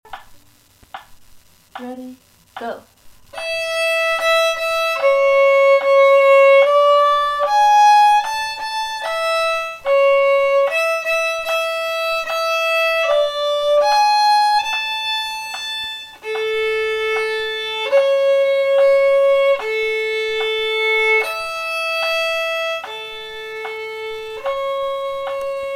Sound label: harmony; Aunt Rhody